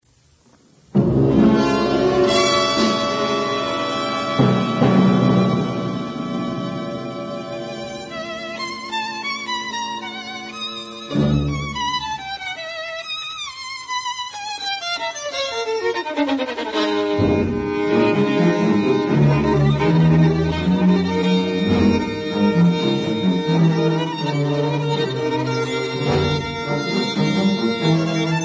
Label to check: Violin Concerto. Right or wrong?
Right